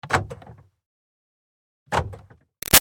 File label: Car Highlander Door Locks; 2005 Toyota Highlander V6; Cars; Vehicles; Car Sound Effects